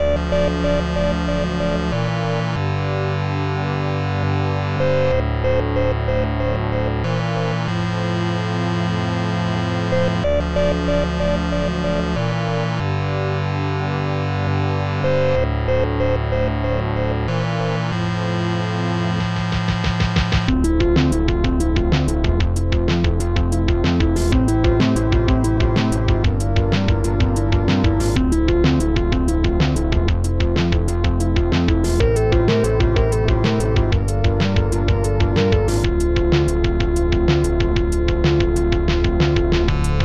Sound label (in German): musik